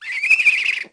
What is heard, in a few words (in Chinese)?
猫头鹰; 红色警戒2; 环境音效